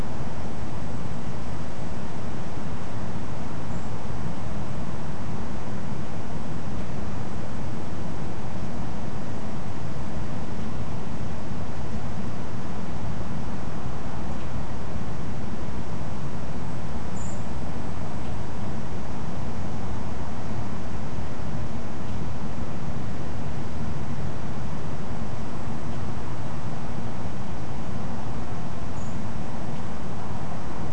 thrush sp three calls 7 9kHz not Eyebrowed, nocturnal flight call; nocturnal flight call project in beijing autumn 2021